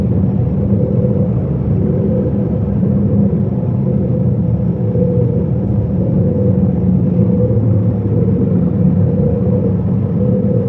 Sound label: ambient science ship hum; Stellaris